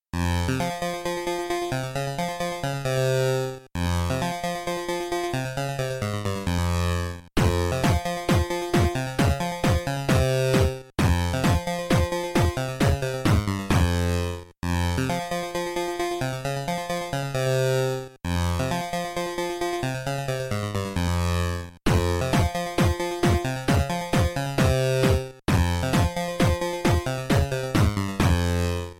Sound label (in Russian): 8 bitnyy zvuk melodiya 8bit